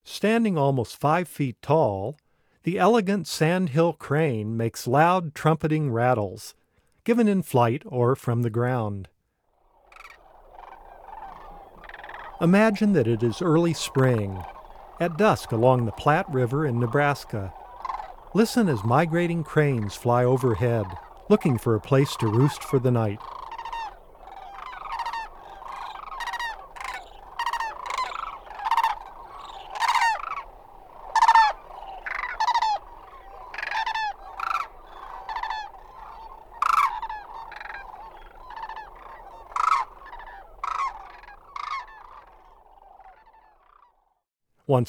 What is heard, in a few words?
Sandhill Crane, Bird Sounds; North America; Common Western Species; Know Your Bird Sounds